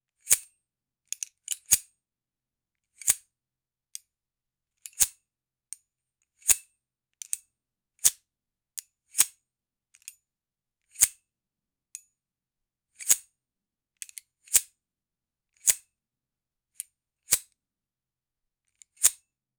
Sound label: gun revolver old; guns